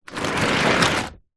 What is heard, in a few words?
foley container tub plastic pull drag scrape concrete light grit